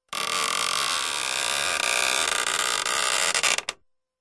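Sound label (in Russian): дверные скрипы; Dvernye skripy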